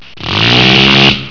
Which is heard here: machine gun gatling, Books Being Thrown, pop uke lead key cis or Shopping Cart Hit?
machine gun gatling